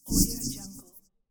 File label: scifi biomechanical interface notification